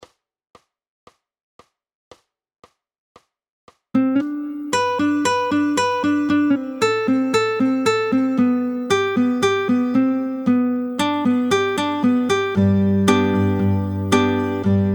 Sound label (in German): Git. 2 Bass